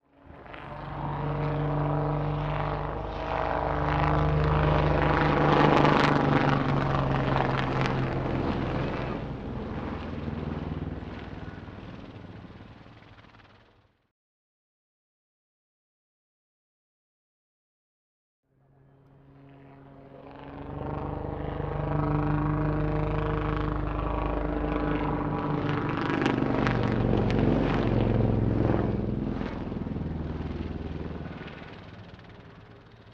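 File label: Heli Lynx By, helicopter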